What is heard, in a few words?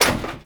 Metal Crate Hit; Gameplay; Warframe; Tau; Destructible Dynamic